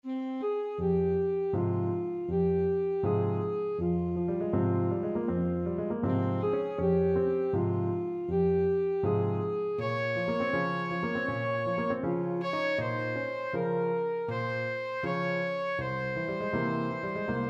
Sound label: saxophone; forza